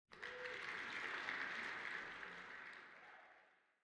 asmr clap

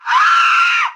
scream; jerry

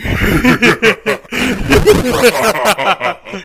laugh

